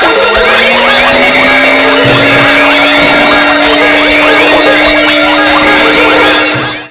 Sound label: win big; The Official Ms. Metaverse Judge Handbook